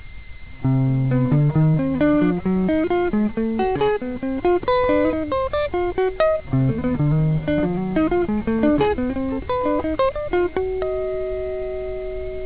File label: sym dim scale 7ths invert